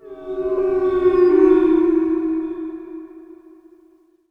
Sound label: ambience ghosts